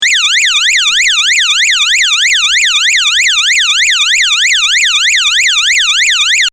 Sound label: siren single; Transducers USA